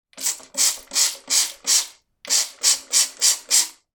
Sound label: household bleach cleaner spraying onto surface